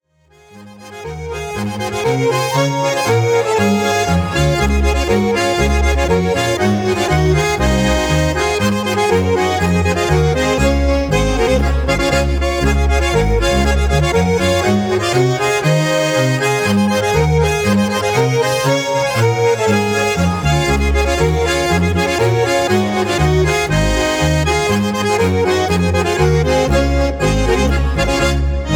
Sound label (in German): Schottisch